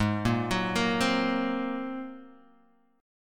Bb 7th Suspended 2nd Sharp 5th Ab

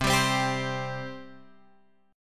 C 5th C; C5 Chord {8 10 10 X 8 8} strummed

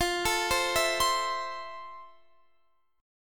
F Major 7th F

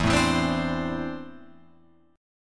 E 7th Suspended 4th Sharp 5th, Guitar, Open F; E7sus4#5 strummed; JGuitar

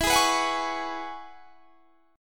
E 6th Add 9th E down; E6add9; strummed

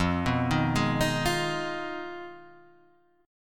F Minor 7th Flat 5th F down Guitar Half Step Down

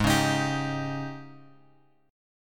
G sharp Major 7th Suspended 4th; G#M7sus4 Chord {4 4 X X 4 3} strummed

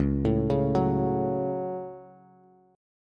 Db Suspended 4th Sharp 5th, Bass; arpeggiated

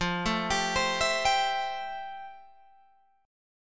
F Major 9th F, Guitar, Open G

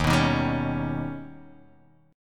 D sharp Augmented 7th D sharp, Guitar Drop D

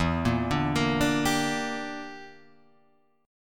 E Minor 7th Flat 5th E

